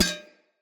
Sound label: Shield Foley Hit; Weapons; Enemy; Shields; Sinecure Shield